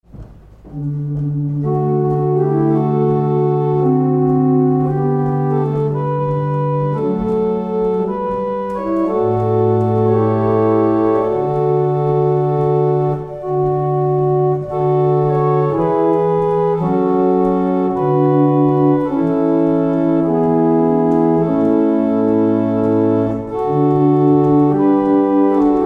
barrel organ; Letheringsett

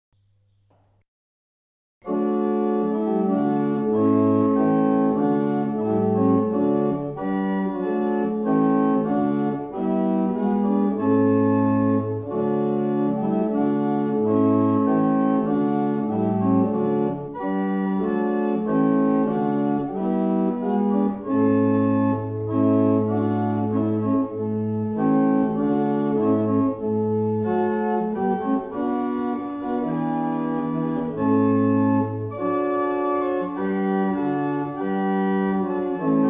barrel organ; Mattishall

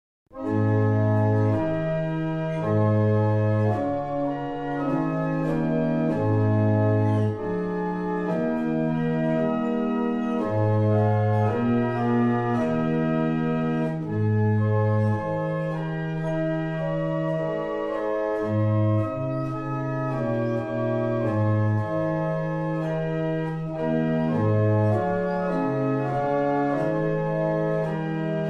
barrel organs; Bressingham

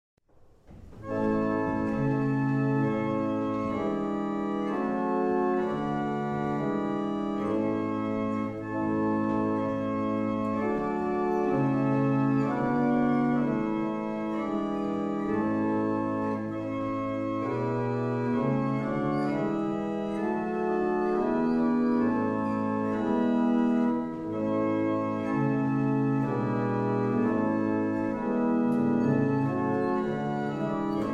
Hymn, barrel organs; Wissington; Luthers Hymn

